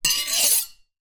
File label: knife large blade scrape hard surface or other blade